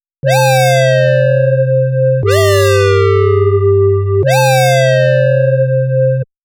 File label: Synth; プロの音プロの技・令和版